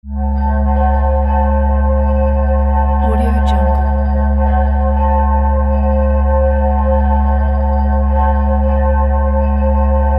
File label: mystical atmosphere